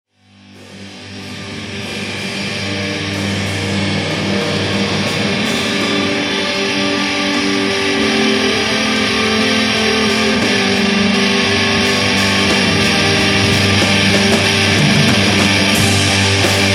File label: winding down